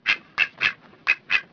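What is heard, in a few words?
duck, nakigoe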